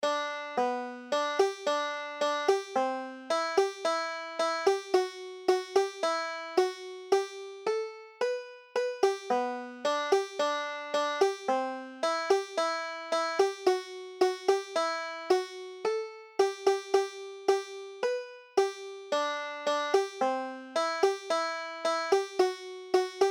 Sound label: banjo